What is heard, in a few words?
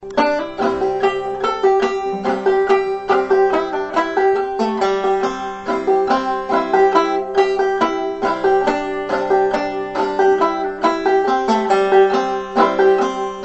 Lonesome Blues Banjo